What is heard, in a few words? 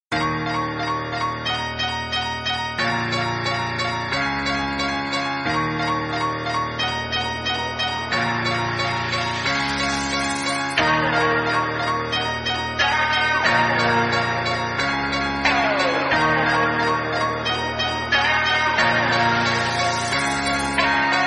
Instrumental